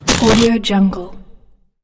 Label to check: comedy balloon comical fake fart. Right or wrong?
Right